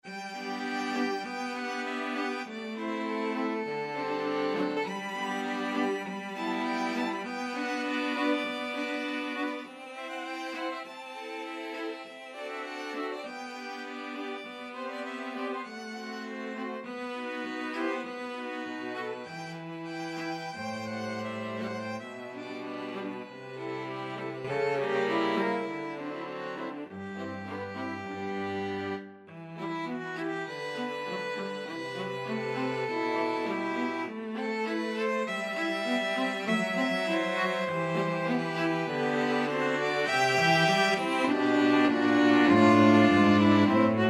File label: chanson de matin STRQ, string quartet; elgar